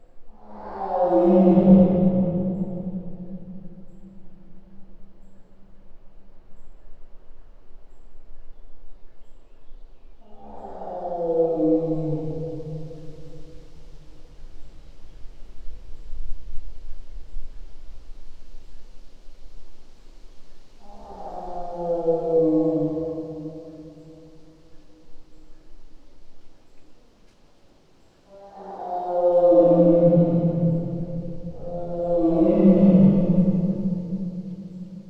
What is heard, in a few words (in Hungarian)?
az ablak közeléből, szibériai tigris; veszpremi zoo 2013